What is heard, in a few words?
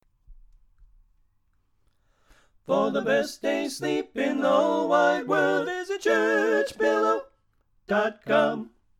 Bari Left; Pennsylvania Groups